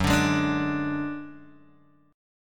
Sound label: F sharp Suspended 2nd Sharp 5th, Guitar, DADGAD; chordshape; down